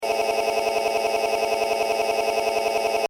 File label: Beeps Data Transmission Technology; Beep Sound Effects; Scifi Science Fiction Multimedia; BBFX